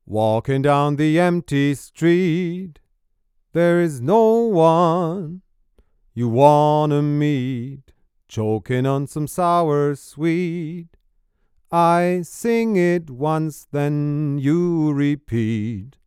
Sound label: Bock 251 Male Vocal V376a Niere 10 cm; Universal Audio